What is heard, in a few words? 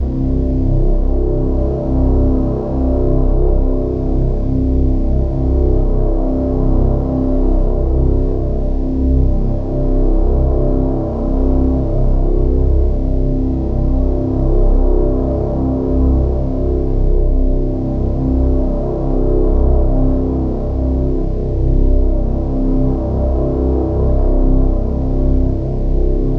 Reese Bass; 808